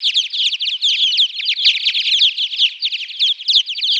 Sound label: Mexican Free Tailed, Bats